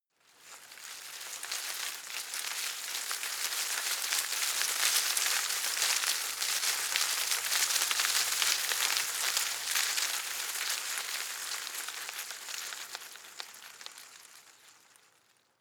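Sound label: shrub small leaves rustle heavy, garden; haus-garten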